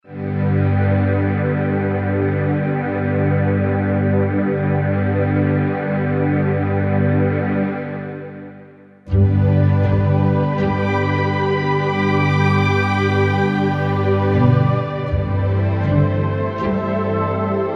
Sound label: Dramatic Strings